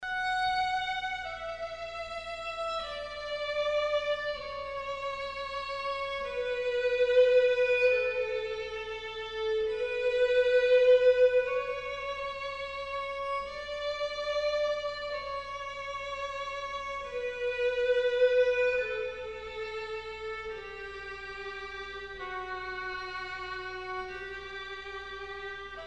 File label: Pachelbel Canon